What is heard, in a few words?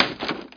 signs; PC Action 1998 December; Prangs